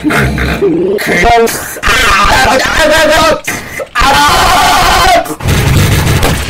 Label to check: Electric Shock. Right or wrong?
Wrong